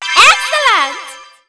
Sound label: COMBO EXCELLENT